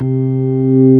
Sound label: ORG B3 C 3; Best Service Gigapack 2